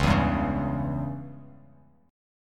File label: C sharp Diminished C sharp, Bass Standard; strummed; chord; 9,7,5,6; JGuitar